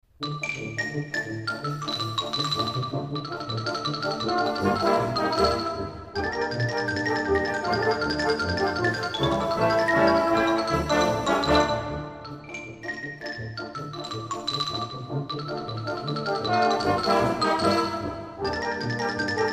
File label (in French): extrait xylophone